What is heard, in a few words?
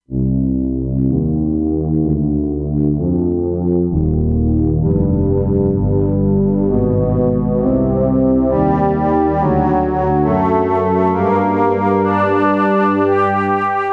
Lo Wind Inst; Factory Presets; E-mu Proteus-2